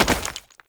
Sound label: Step Rock, Foley; Warframe; Oraxia